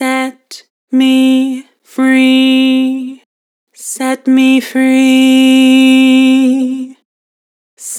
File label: Vibrato, Vocals; Harmor Vocals